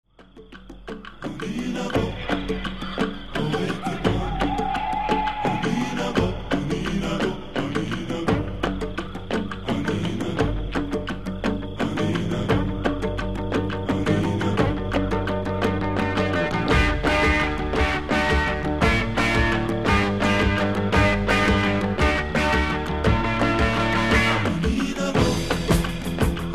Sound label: Kit Mambo